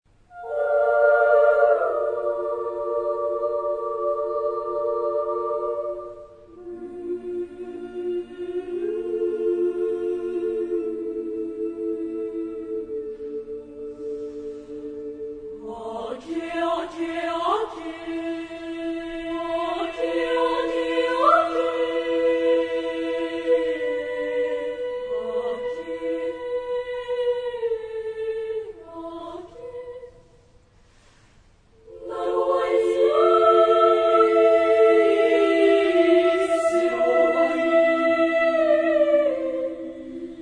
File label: female; Fukushima; Nantoo uta asobi; chorus